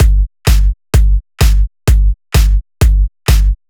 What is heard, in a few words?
Housebeats 128BPM; Vengeance Samples